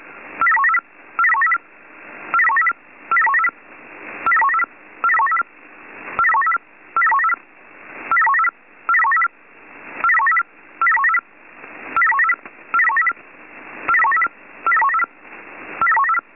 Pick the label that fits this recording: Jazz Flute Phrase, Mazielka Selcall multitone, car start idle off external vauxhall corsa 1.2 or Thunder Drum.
Mazielka Selcall multitone